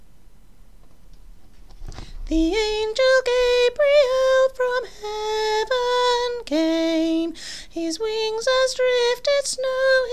soprano, Choir Christmas; Angel Gabriel

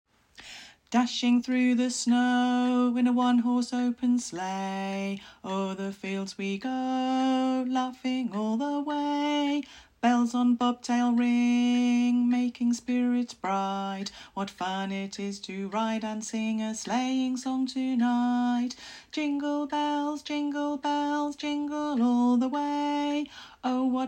TENOR, Choir; Jingle Bells; Christmas; church

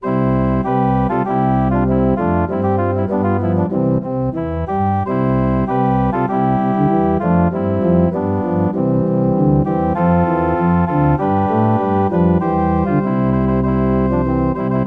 Backing; Joy to the World; Christmas

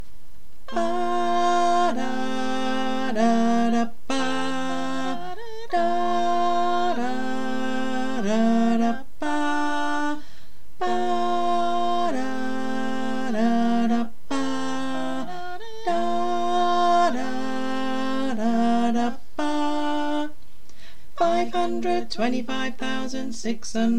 Alto; church

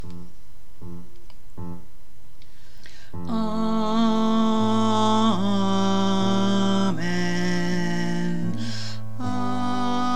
Amen Tenor, Choir; Bridgewater; Choir Spring Summer 25

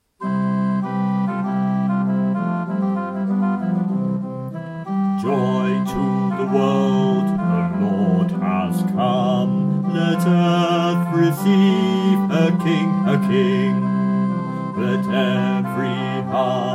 Bass; Joy to the World; Christmas

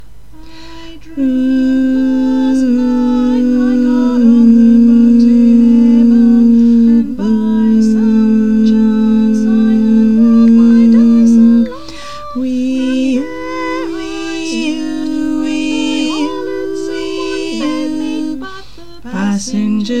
Sit Down TENOR BASS, Choir; church; Choir Spring Summer 26

